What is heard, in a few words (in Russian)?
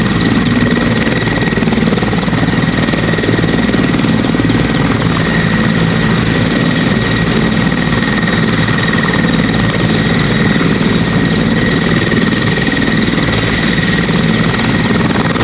zvuk aerodroma